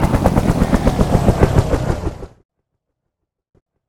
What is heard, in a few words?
Helicopter Stop